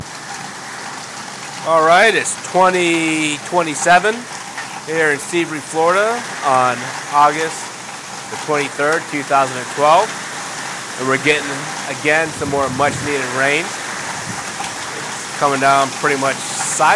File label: thunder storm with hail